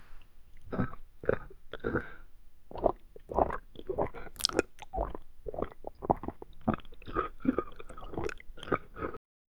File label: slowly gulping pudding